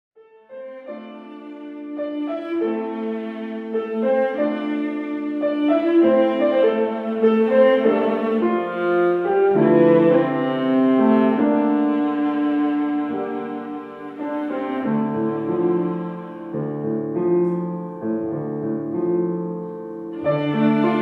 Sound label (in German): Mondlicht, viola mit klavier; trauermusik leipzig